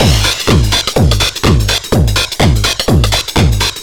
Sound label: choke, Deep House 125bpm